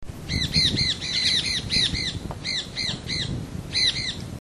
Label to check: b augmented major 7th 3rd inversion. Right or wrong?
Wrong